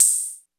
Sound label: 909 HIGH; E-MU Producer Series Vol. 2; More Studio Essentials; Composer; Technopourri